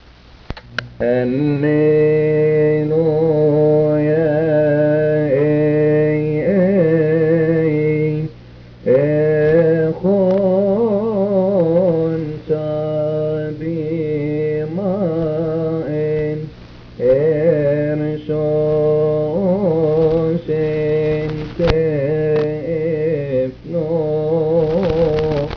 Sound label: Chant; Alleluia Eiei (This is the Day that the Lord has made); Coptic Network